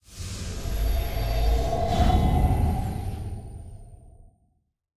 Character Change; Santuario

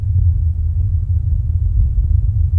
Carcass; knight online

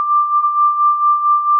Tuning Fork

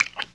eating chew; MiniGames

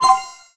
ui choice button; Academy

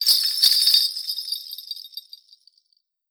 Psycho Imp Bells

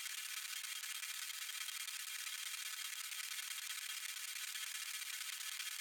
Battery Indicator Machine Broken Loop

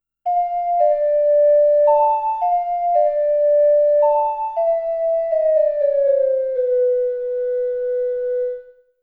Inverted, Ocarina; Inverted Song of Time - Ocarina

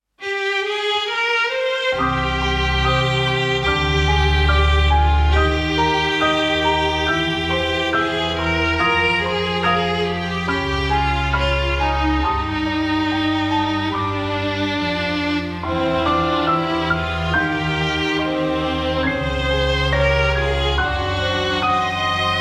Gameover; Bravely Second End Layer

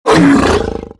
Enemy Simagrl damaged

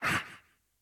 Attack

